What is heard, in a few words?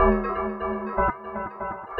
Tape Arp